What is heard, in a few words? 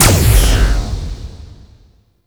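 Projectile Explosion; Unairu Sundering Dissipation; Warframe; Focus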